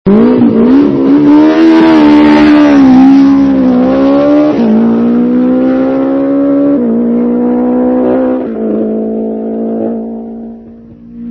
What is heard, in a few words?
radial, motor